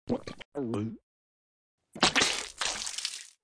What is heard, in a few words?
squirt glass water miss; Toontown Online